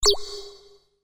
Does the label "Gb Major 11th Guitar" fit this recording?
No